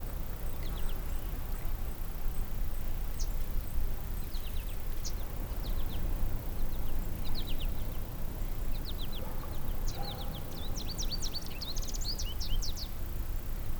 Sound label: Seaside, Birds, Surf, Calm, Dist; Poetry Project